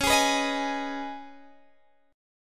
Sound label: C sharp 7th Suspended 2nd, Guitar Drop D